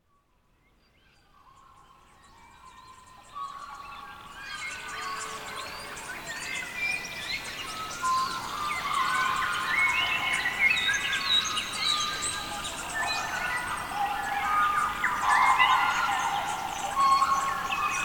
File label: One Hour Long Bird Song